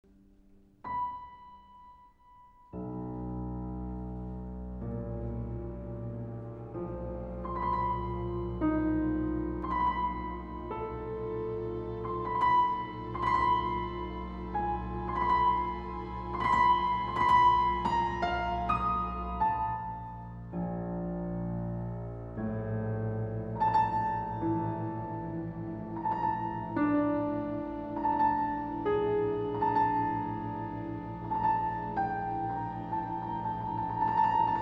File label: Piano concerto; McCabe